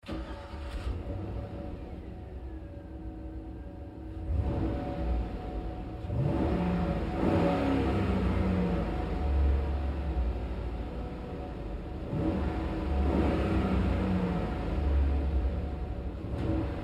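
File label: Truck GMC Savana Interior Start Revs Off Hard; Trucks; Big Room Sound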